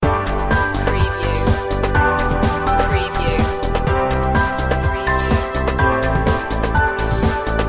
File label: uploading love loop